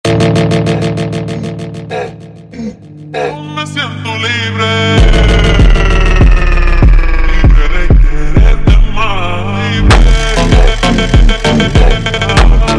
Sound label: funk